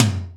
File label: TIM TOM; Greytsounds; Scott Peers Sound Engineering